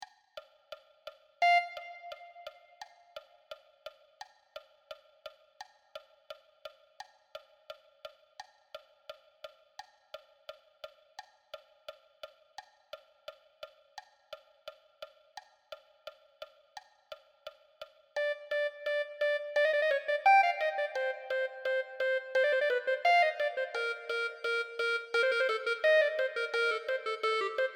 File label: Clarinet, marching band